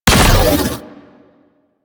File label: weapon beam fire; Warframe; Corpus; Corpus Railjack; Crp Spec Ops Ice Moa